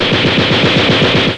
machine gun; Sound Effects for Multimedia - Volume 1

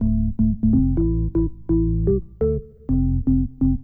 organ house 125 A; eJay; Keys

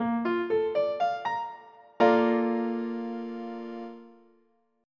Bb maj7

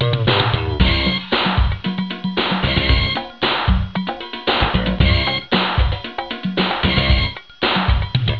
BUSY

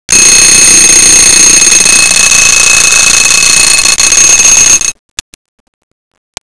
MEHANICS

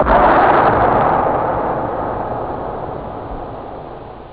peng

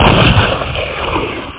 Gunshot; F1 Licenseware - Volume 1

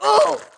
female hit

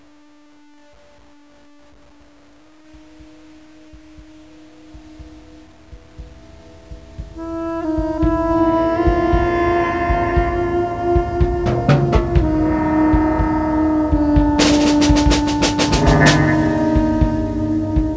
Beat; Reflux

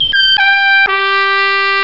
trumpet; DeluxeMusic2

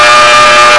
engine; WRECKAGE

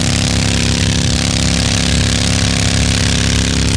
HARLEY; mega2

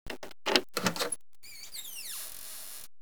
DOOR OPEN; CAPSTONE

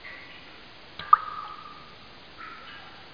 cave

